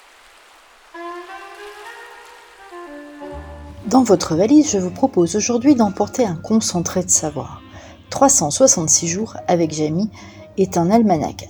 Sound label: pad